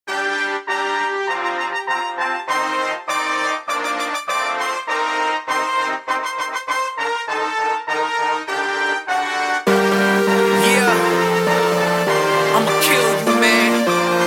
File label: Rap Song